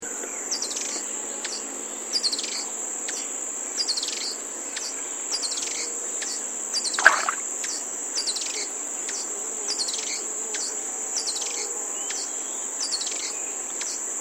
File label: american pygmy kingfisher